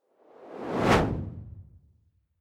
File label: whoosh simple hard incoming high